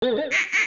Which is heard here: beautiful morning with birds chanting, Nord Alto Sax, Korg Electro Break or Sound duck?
Sound duck